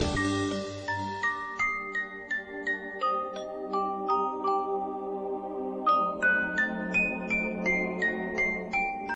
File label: Romantic Bells